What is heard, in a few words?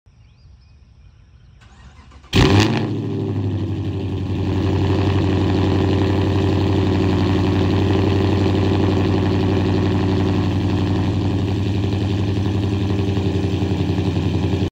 chrysler